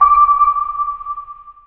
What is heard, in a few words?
sonar ping; ringtones